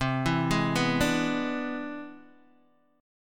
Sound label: C Augmented Major 9th, Guitar, DADGAD